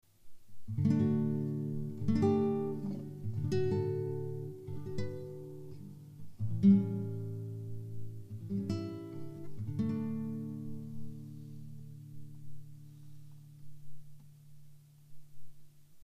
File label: BELLS